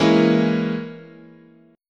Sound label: E 6th Flat 5th, chord